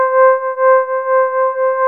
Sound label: TX SOFT C4, SYNTH; AKAI S-Series CD-ROM Sound Library VOL-2